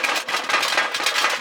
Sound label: Armour Rattle; SFX Kit; Creaks, Scrapes, & Misc